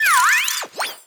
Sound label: ambient; bergmite